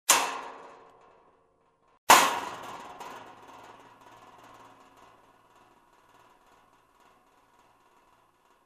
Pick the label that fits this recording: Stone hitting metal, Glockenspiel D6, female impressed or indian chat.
Stone hitting metal